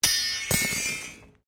WRECK SUSPENSION SPRING IMPACT CONCRETE, Wrecked Cars; Wrecked Cars, impacts, crashes, scrapes; Sound Effects:Objects